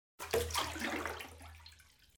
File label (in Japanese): 湯につかる; おまけ 単発効果音